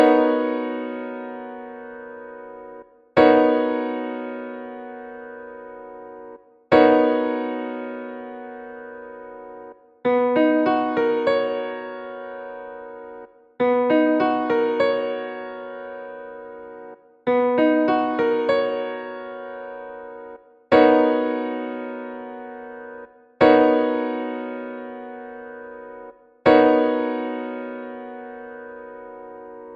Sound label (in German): Hmaj9 Piano Chord